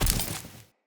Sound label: Walk Vegetation, Footsteps; Characters; Enemy; LadyDeora